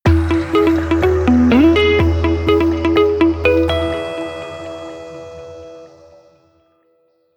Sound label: Stereo Electronic, ringtones; Lenovo; ZUI-13